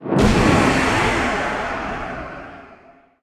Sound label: Void Scream Spell; Hero